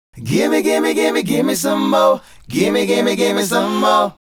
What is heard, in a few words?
Male Group, Vocal